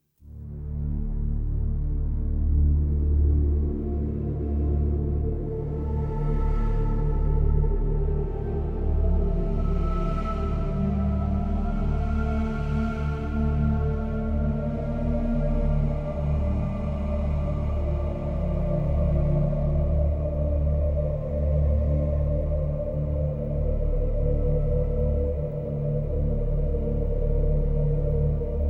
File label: original score sad to triumph